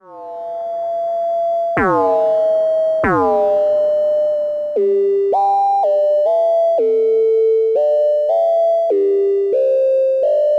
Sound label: delay mod module, analogsynth